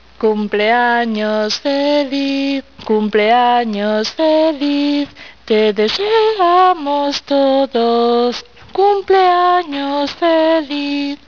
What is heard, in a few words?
spanish song